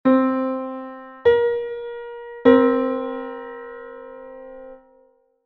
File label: seventh (7-EDO)